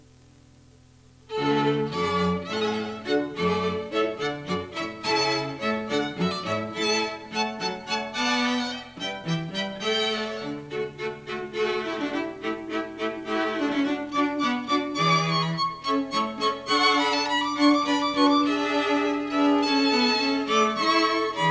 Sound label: Hornpipe